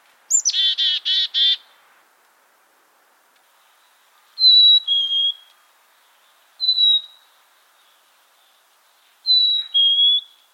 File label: chickadee